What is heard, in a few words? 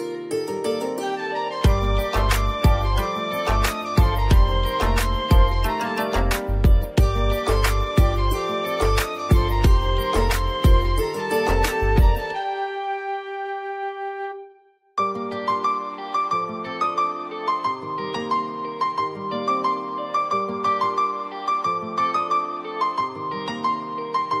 Instrumental Song Ringtone